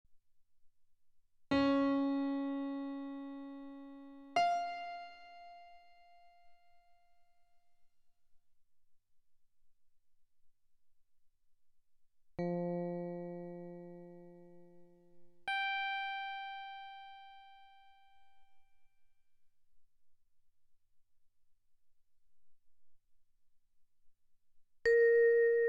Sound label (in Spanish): intervalos abiertos